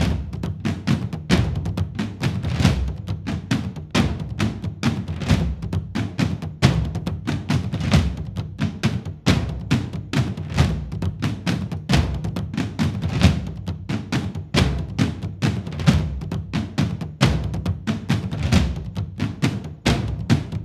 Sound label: Drums; For Honor